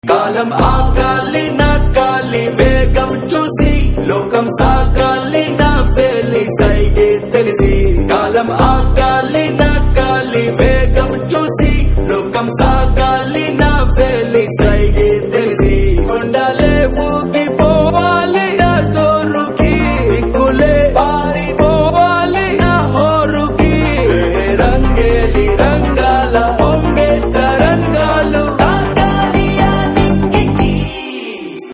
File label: Telugu Ringtones and BGM; Disco Raja